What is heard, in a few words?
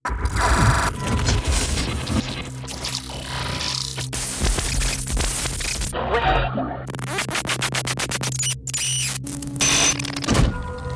glitches sound fx; just sound effects